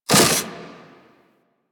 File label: Reaper Meter Full; Warframe; Wraith